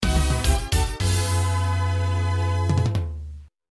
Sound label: win